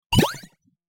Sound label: jump